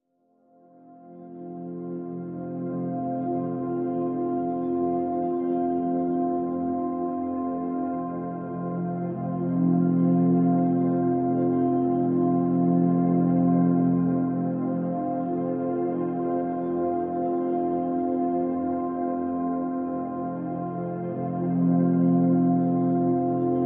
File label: Pads (F)